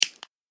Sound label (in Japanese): ライター, 生活